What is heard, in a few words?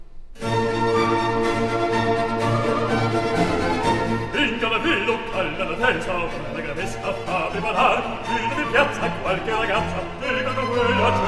Aria; Finch han dal vino